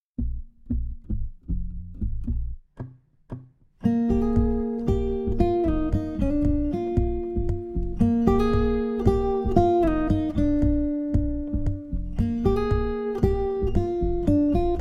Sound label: Acoustic Instrumental